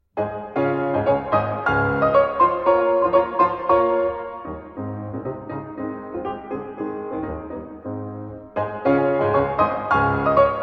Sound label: Symphonic Etudes Finale Allegro brillante; Op XVIII Etude XII